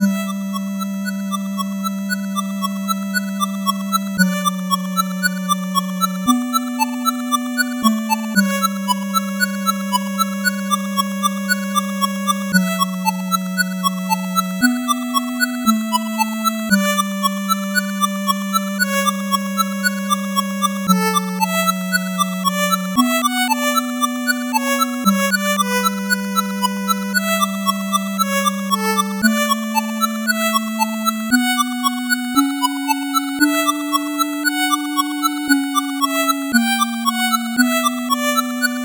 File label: 8bit bgm fast